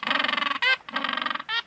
penguin squawk; Animals

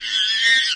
monkey scream; Animals

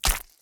meat slap, Footsteps

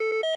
defib safety on

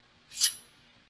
scalpel, Surgery; Medical; civ14; Shitmed

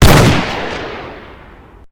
Barrett M99, Guns Fire; civ13; civ14; Weapons

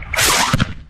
new artillery incoming, Cannons; Explosives; Civ

